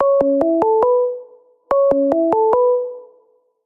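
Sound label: Hangouts video call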